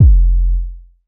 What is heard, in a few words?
bass 808 fuzz F; Polyend; ARC Noise